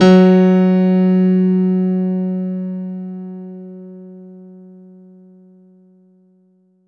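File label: F#2 DANCE, ANALOG SEQ, Roland JV 2080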